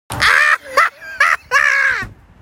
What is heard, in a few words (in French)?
rire